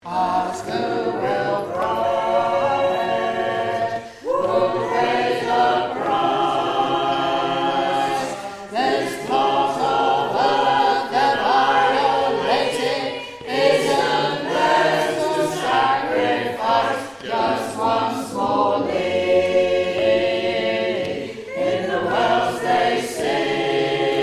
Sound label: Fracking All